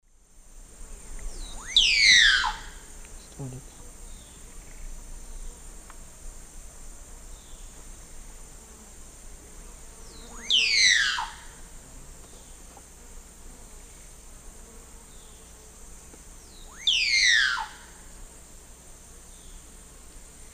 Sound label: Clypicterus oseryi, display song; S. America; Eastern Lowlands